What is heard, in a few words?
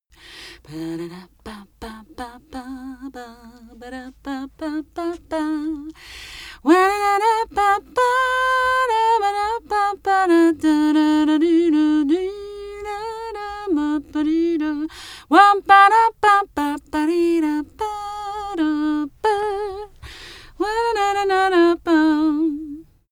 female sing jazz impro